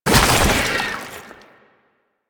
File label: Underground Attack Tentacle Burrow; Enemies; Abilities; Warframe; Proto Infested; Babau